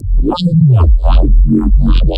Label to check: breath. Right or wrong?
Wrong